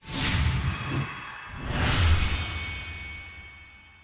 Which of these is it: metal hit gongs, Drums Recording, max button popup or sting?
max button popup